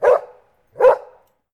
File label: dog close; DeadFright